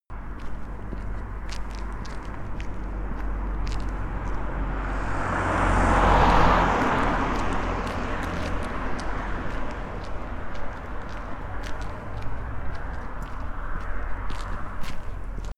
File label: walking down the street